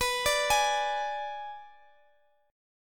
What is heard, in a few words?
B Minor 6th B Guitar Open F, chord; arpeggiated; x,x,x,18,17,15